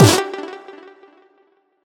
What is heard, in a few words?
combo power; quatuor